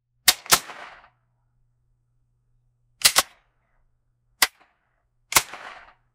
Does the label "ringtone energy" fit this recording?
No